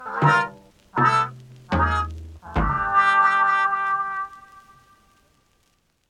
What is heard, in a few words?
jingle survival lose; Pix the Cat